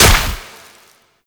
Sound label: Proc Explosion; Warframe; Mods